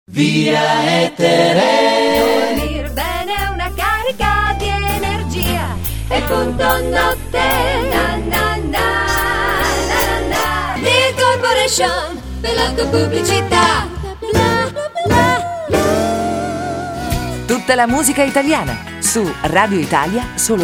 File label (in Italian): jingle cantati; viaetere